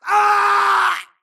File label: grunt electro; Titanfall 2